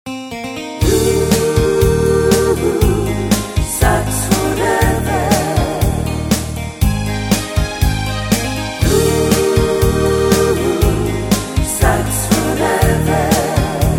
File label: sax